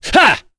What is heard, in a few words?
Vox Attack; Nicx; kingsraid